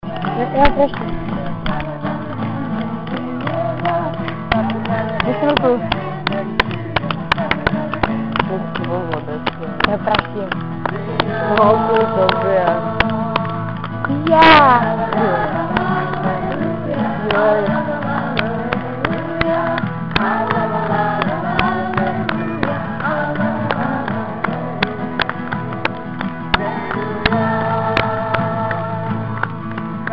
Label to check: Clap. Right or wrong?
Right